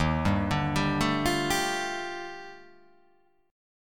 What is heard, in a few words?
D sharp Major Sharp 11th D sharp, chord